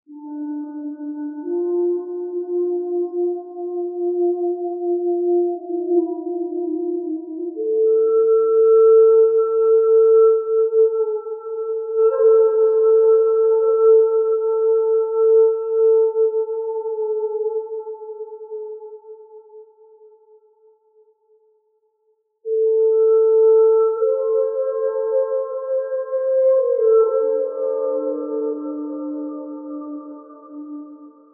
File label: Crater Flute; Age of Conan Rise of the Godslayer